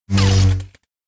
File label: lightsaber clash